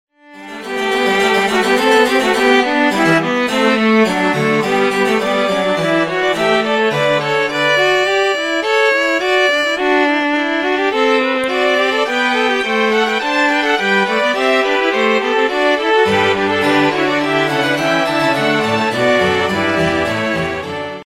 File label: best relaxing sound ringtone